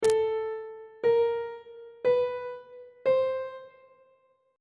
g double sharp 2nd interval pitches on treble clef